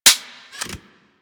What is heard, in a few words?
Fire Mech, Rifles; Snipetron Vandal